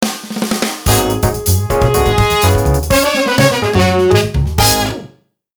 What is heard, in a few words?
jingle